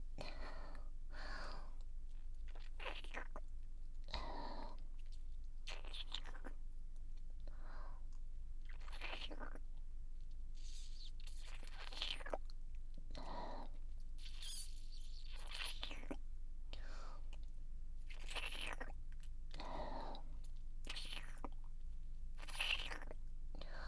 ディープキスコース, ASMR

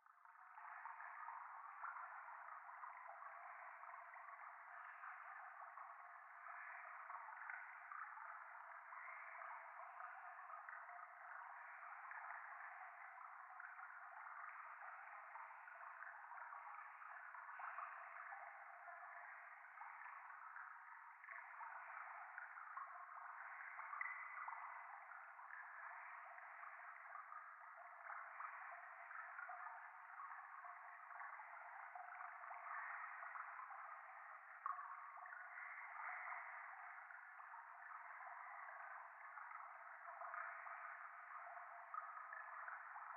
廃墟 右耳密着吐息 川のせせらぎ

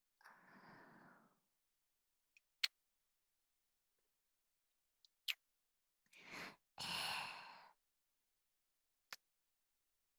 キス; SEあり

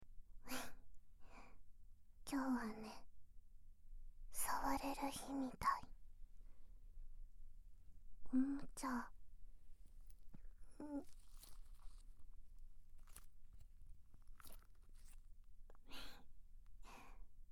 しゃきしゃきお耳マッサージ しゃきしゃきボールで耳奥ぐちゅぐちゅ お耳もみもみマッサージ; しろのおもちゃ; ご機嫌囁き

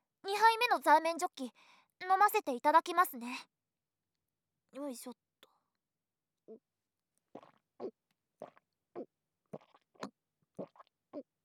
特大大ゲップ！！; 二杯目のザーメンジョッキ特大大ゲップ！！; ザーメンゲップ集

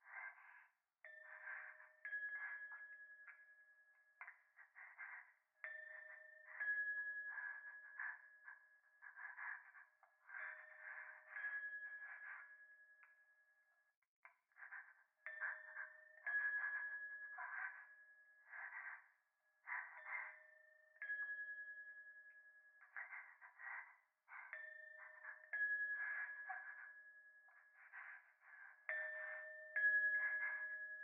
風呂 右耳 快感 吐息 神社

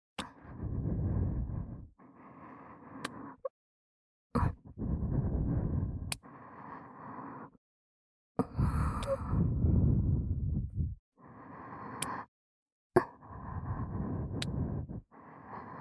(左)おまけの耳元囁き喘ぎエッチ, KU100 パンパン系効果音版

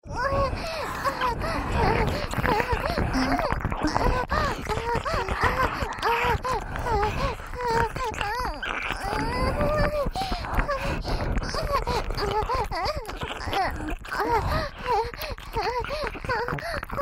本番喘ぎ SE無 ＋両耳れろれろ舐め; おまけループ（オナサポ）; 3WAYループ

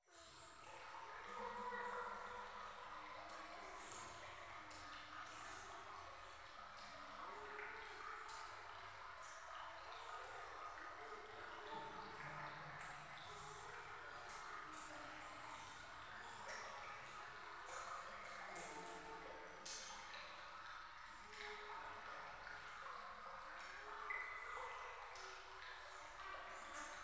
教会 イラマチオ 川のせせらぎ

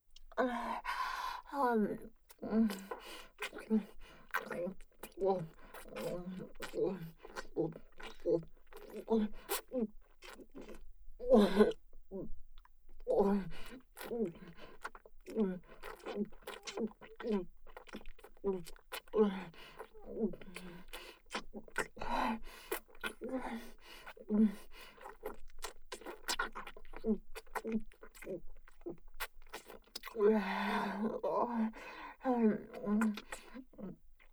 ぐっぽりフェラループトラック, ASMR

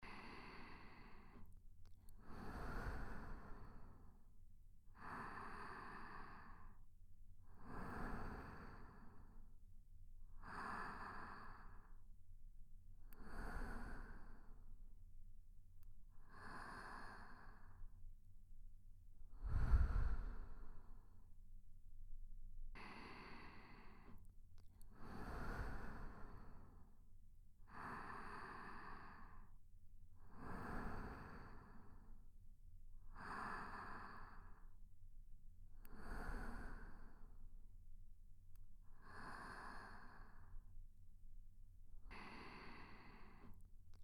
寝息 ループ; 効果音あり

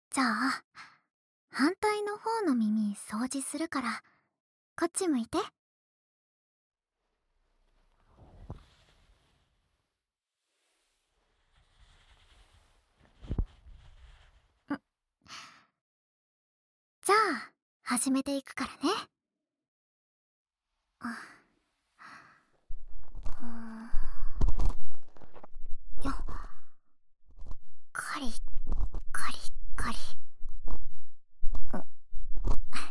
【左耳】ヤナギの耳かき, ASMR

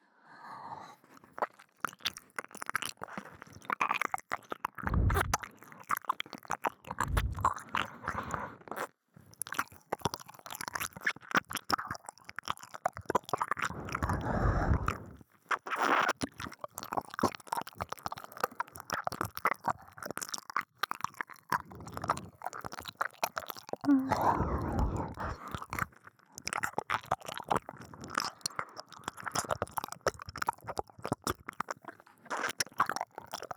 右耳舐め, ASMR

